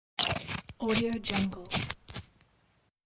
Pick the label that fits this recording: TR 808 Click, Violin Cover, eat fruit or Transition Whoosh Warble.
eat fruit